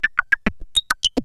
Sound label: FANFARE, SIGNALS; AKAI Anime Sound FX